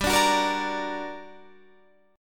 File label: G Minor Major 7th, down, Guitar, Standard; GmM7 strummed; JGuitar